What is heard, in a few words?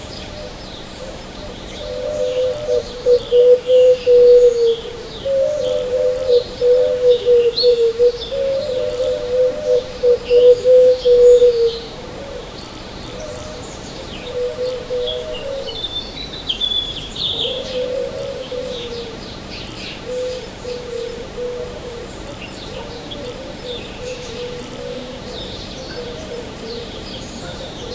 a peaceful village early, morning